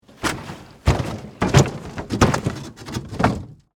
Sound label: Impact Wood Object, Fall, Over, Heavy; Roll